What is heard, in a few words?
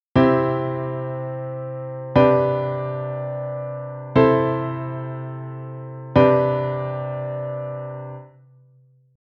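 on chord piano pedal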